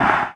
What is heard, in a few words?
One Shot, Hits